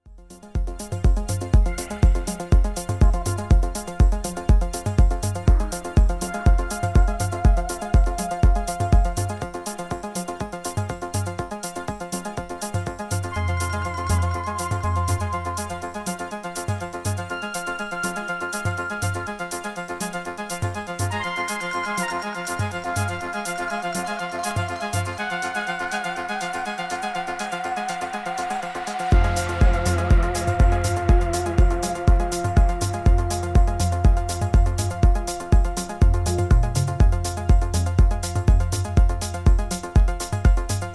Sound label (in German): Stanton T.92 M2 USB int. Interface orig. Abnehmer